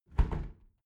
window close tilt regular sequence

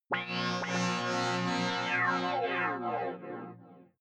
sci-fi rising small planet

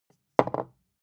bottle put down tipping

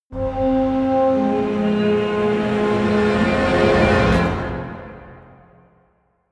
medieval jingle orchestral negative

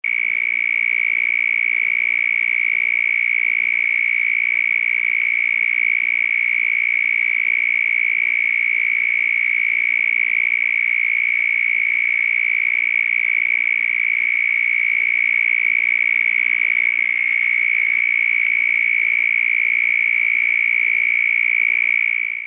cicada at night

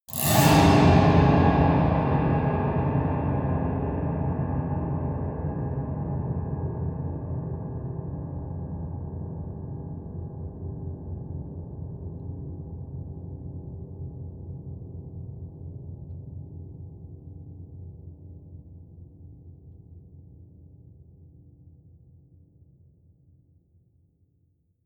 piano twang multiple fast long

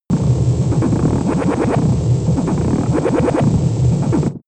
space alien machinery working

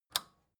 record player power release off

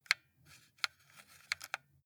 console controller xbox joystick move multiple

